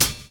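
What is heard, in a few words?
drum chop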